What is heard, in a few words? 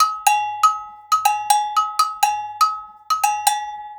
120 AGOG, Percussion Loops, AGOGOS